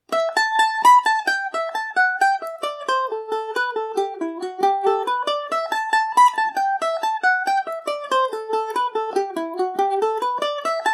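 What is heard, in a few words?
A dorian, Irish mandolin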